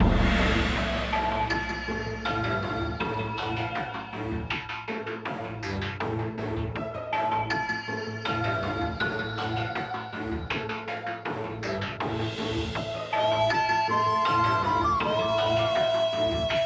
BGM Stage; bellatia; GATA

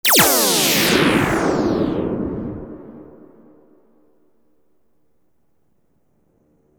Jingle Stage clear; Blinx 2 Masters of Time and Space; Xbox

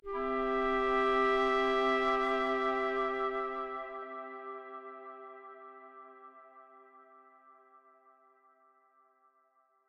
Stingers Effect and Cause - success

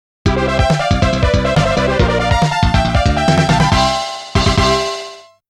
All Stage Clear; rockman sound box 2014

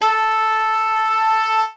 Sax tone; lego my style preschool

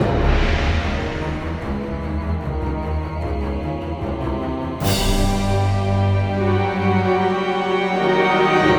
Music Stings

